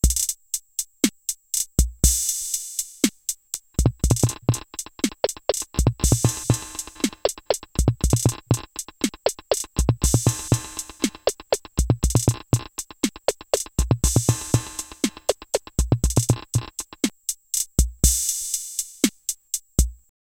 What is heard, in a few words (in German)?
Drums mit Effekten Pitch Delay